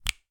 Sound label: Click Finger Snap